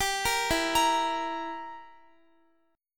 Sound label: E Diminished down Guitar; arpeggiated